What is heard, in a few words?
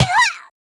Medica F Damaged